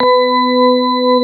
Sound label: CX3 ORG B3, Keyboards Old School; USB Soundscan vol.09 - Keyboards Old School; AKAI